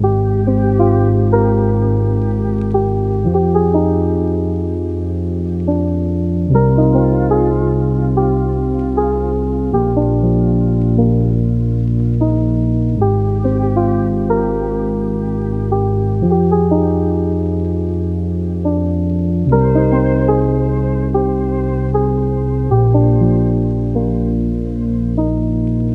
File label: 74 BPM C Min, Lofi Melodies; Summer Daze; Cymatics; Lofi Starter Pack